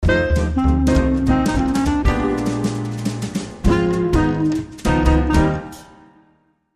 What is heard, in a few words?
Jive, Jingle